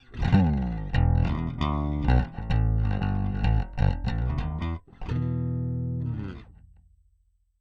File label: bass dry